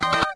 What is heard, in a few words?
minimize; windows 9x sound schemes; the 60's usa